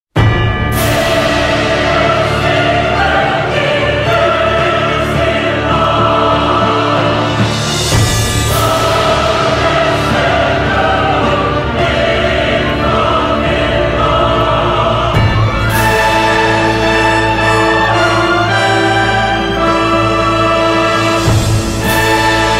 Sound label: anime music theme; death note